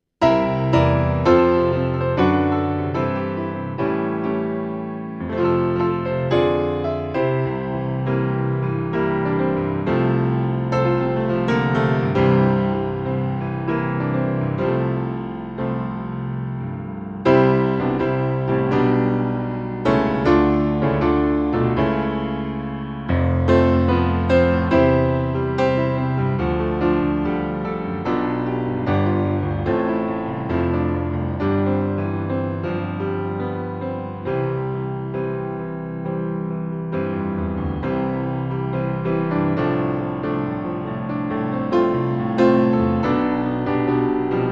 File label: piano